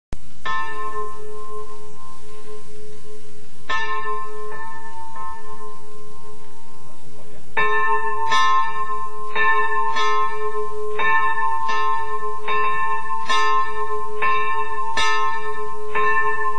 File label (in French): volee historique, carillon